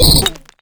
SOUND EFFECT, Metal; AKAI S6000 CD-ROM - Volume 1

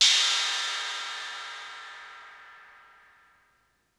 Cymbal Ring Long; Lost Tapes From Mars

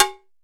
cow open; East West; Global Sounds; RY30 PERCS

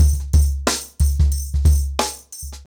Nuclear 90BPM, ACOUSTIC DRUMS

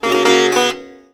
SITAR GRV, World Colours; Best Service

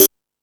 High Hat, Drum Machines

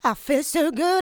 Female Vocals; Houseworx

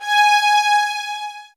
G, soft violins

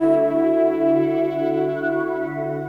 Myriad Pad, Jungle; M8

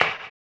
HAMBONE; Blues & Soul

